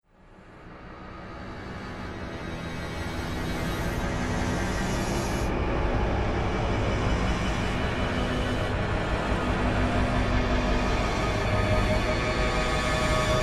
Soundscape Laser Realm, science fiction sound effects